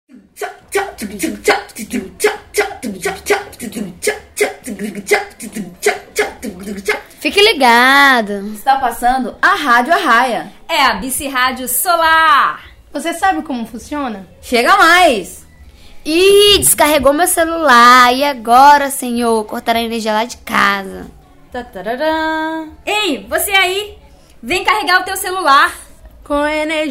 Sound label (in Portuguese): Jingle recarregar celular